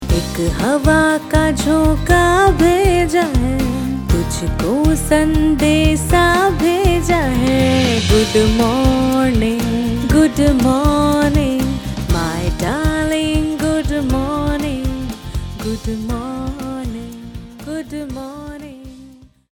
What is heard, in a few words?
GOODMORNING PARENT TO KID HINDI FEMALE